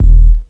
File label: Low Kick; EMU MoPhatt